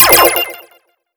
wild Transform; netent

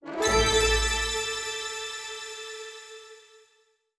small win; netent

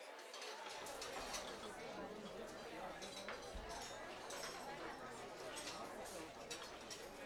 Ambi General; netent

